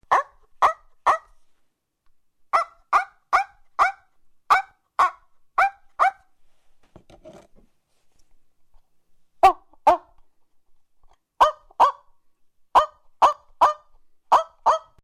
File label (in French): chien